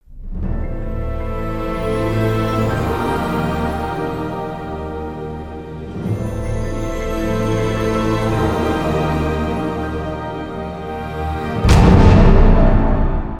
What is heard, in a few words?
music cue